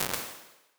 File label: Venue; Small Spaces; Outdoor Spaces; Impulse Responses; Apple